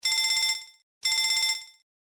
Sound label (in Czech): telefon